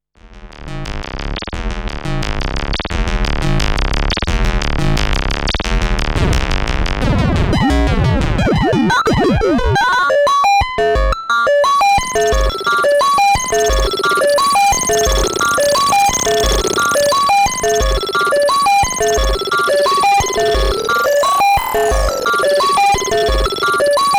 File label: Moog DFAM